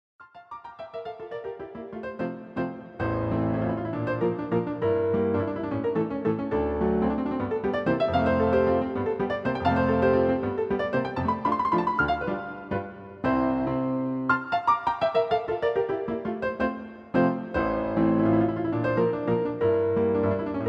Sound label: Country Waltz; Hofman; Anton Rubenstein